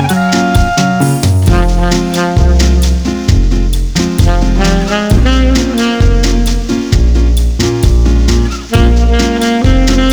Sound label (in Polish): traba